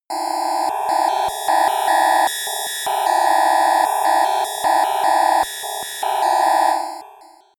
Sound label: atmosphere on off